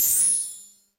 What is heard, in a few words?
badge earned